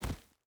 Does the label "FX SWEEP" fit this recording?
No